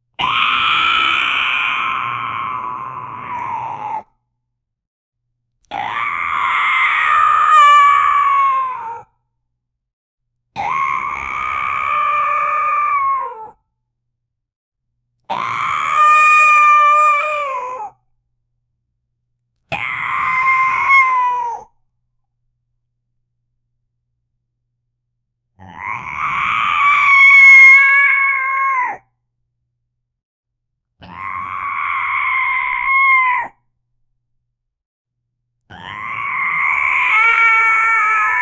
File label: big ahh cat screaming big, cats